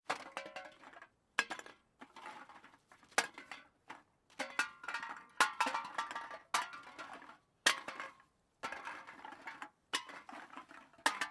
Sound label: dumping cans in cart far